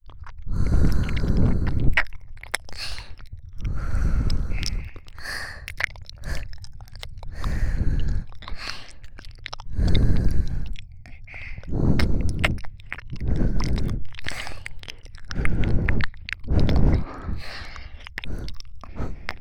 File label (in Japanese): 耳ふ～＆耳舐め